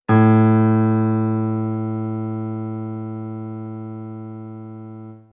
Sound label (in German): klavier a5